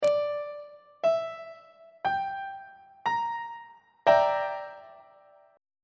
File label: d double sharp half diminished 7th 3rd inversion on treble clef